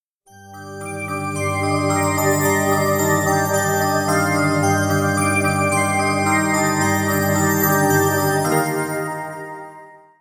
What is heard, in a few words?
sms